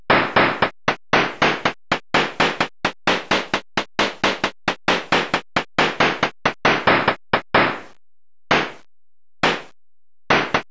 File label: Noise burst